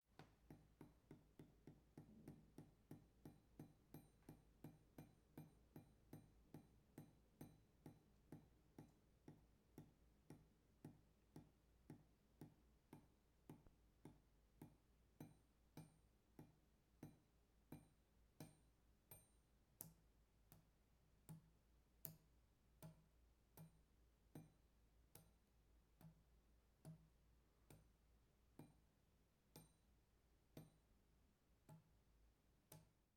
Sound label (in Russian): kapli po metallu bistro zatem medlennee